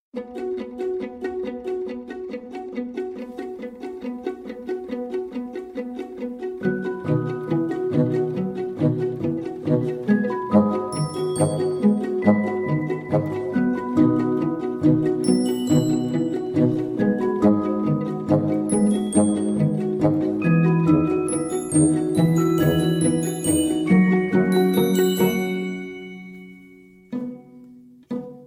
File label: orchestra tv ad; Orchestra Tv Ad (iPhone 5)